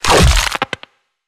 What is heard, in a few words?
Ability Spider Trap Hold Start; Lurker; Evolve; Gorgon